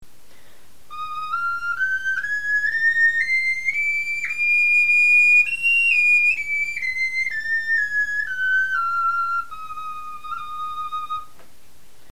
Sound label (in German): tonleiter, knochenfloeten